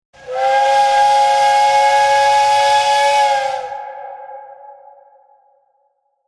Steam Locomotive Whistle, Steam engine whistle with tunnel ambiance.; Trains